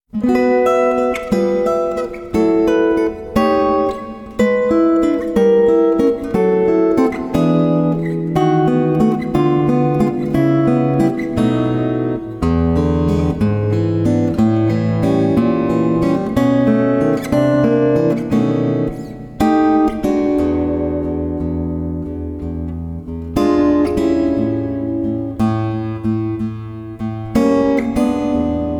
Acoustic Blues Progressions; Guitar Techniques 2019 Issue 294 Spring

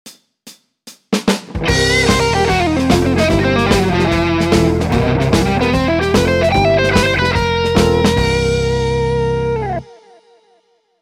One Minute Lick fast, Guitar; Guitar Techniques 2019 Issue 297 July

